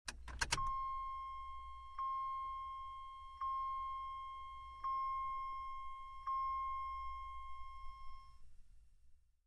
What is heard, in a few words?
Jeep Wagoneer: Electric Seatbelt Chime, From Interior Perspective.